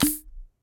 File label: Weapon Fizzle